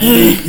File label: pant